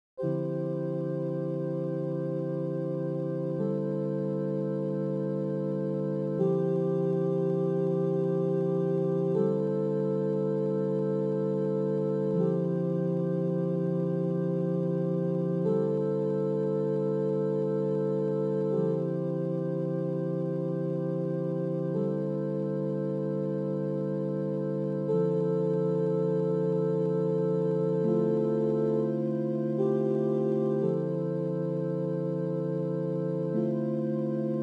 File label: ambient waves